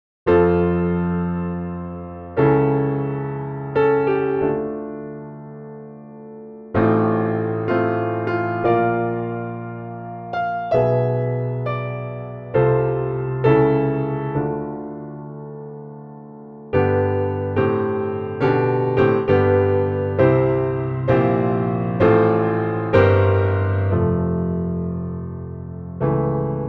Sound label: Steinway B, Pianoteq